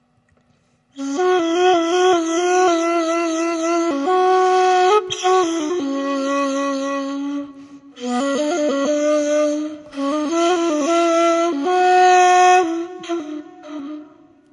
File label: mokhalef segah